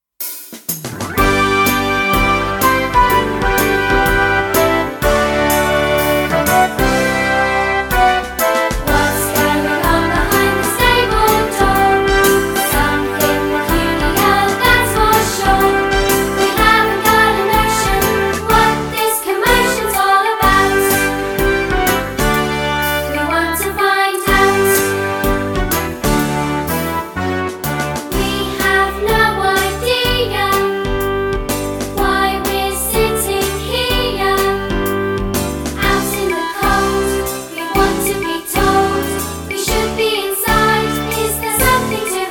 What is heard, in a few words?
with vocals